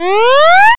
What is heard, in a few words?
jump; PixelJumperHero